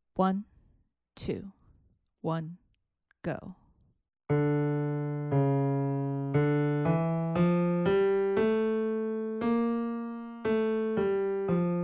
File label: melodic dictation